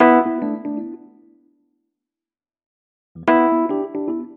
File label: 110 NuJazz _Emaj, E-Piano; Pro Tools Essential Loops; Digidesign